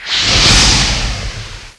FIRE; GRIPEN

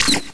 ION GUN

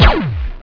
NEW LASER

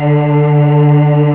aah

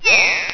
DROP

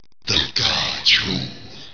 HORN; PHAROAH; RACES

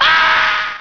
DIE

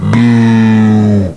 DYING

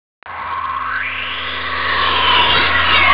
WIND

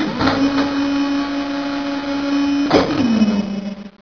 gear

